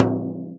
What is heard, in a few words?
FRAME, INDIAN PERC; Big Fish Audio - Didgeridoo and Other Primitive Instruments